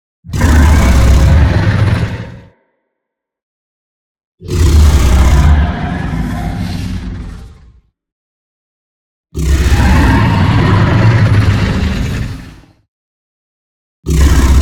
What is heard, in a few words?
Dragon roar building blocks